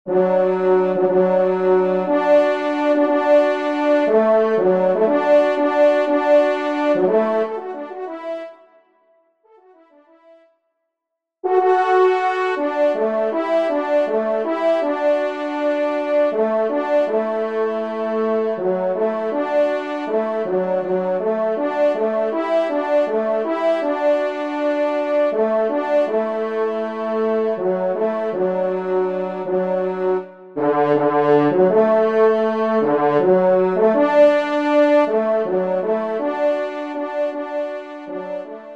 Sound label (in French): trompe; Helene a Mme Minie; E Caneaux